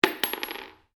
Cartridge 22 250 Rem impact polished wood, shell casings; shell casings of different calibers impacting on different surfaces